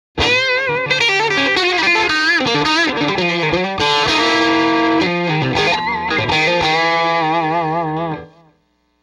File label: m75 tele light od jtm45